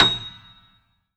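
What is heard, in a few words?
A#7, grand piano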